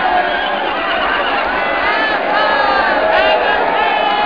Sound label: battle; CManual-3.0